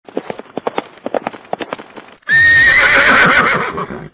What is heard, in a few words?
Whinny Coo, Kuckuck; Kuckucksuhr Wanduhr Pferd; Gift Company